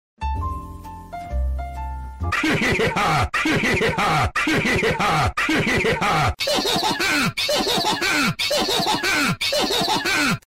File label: LAUGHING; clash royale king laughing emote jr.